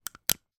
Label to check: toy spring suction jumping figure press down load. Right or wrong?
Right